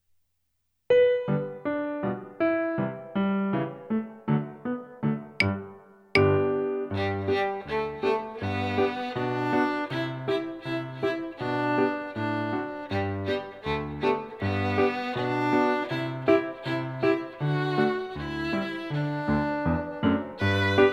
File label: Galop, violín